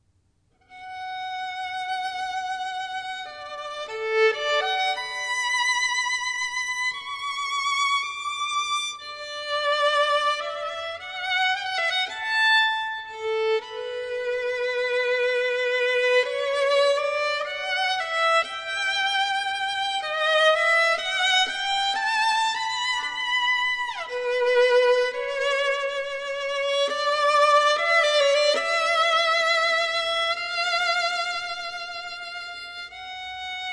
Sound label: Violin; Meditation